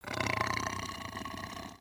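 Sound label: Sabertooth Idle; creatures; pets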